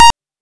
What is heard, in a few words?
apple ii c, boot sounds